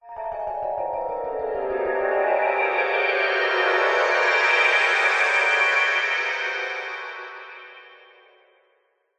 horror descending shrill nightmare; zapsplat

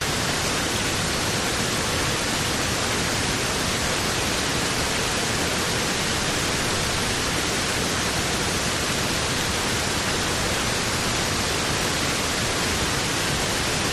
waterfall lateral left front medium distant; bridal falls; your free sounds

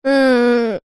human boy aged 9 groan ill unwell

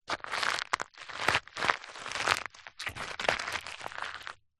footsteps movements scuffs gentle shoes gravel; foley

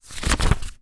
paper notepad page turn; foley; zapsplat

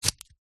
office scissors cutting card

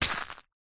leisure hacky sack catch grasp hard

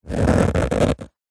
foley leather case thick rub squeak

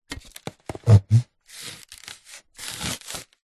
balloon foil small inflated rub hand; foley

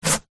office sticky tape rip from cardboard

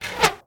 medieval scythian recurve arrow heavy pass by; still north media

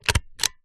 office stapler stapling paper

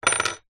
key mortice drop down wood table

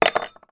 industrial drill bit down on work bench; zapsplat

